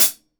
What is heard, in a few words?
STUDIO STEREO HI HAT